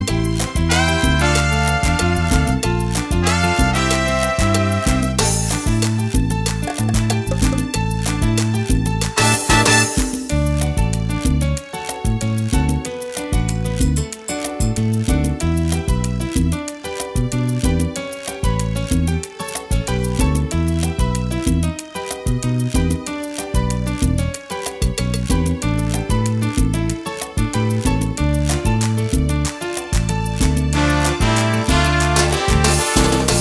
salsa

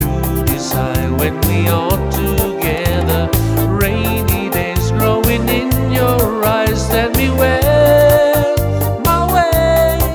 bachata

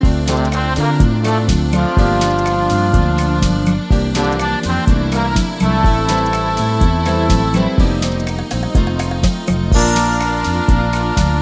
rumba

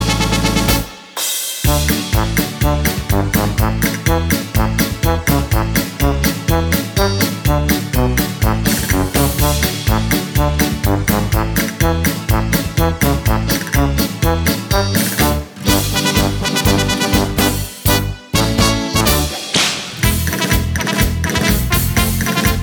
paso doble

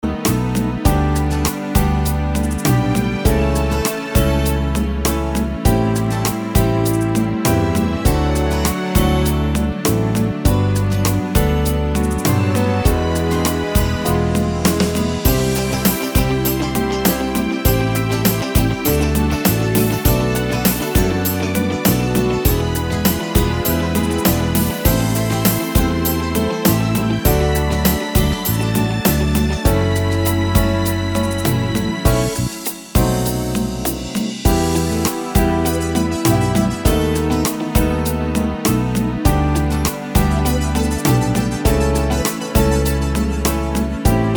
rumba

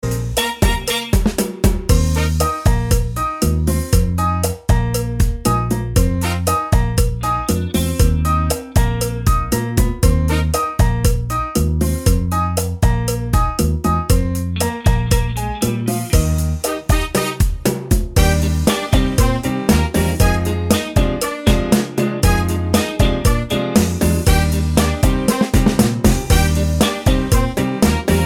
cha cha cha

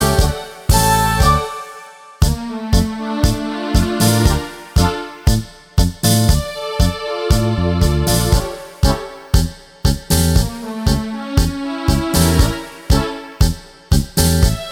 tango

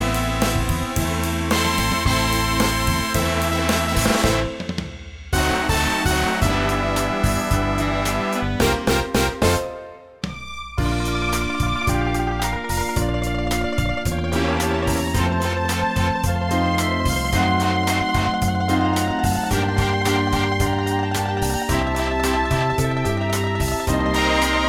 cha cha

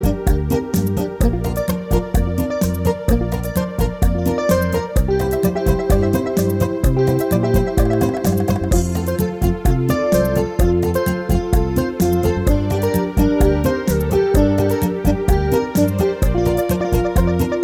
bachata

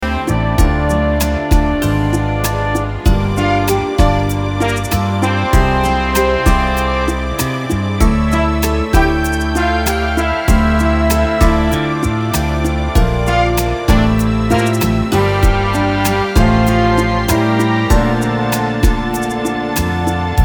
cumbia lenta

